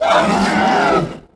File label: roar, offspring; giants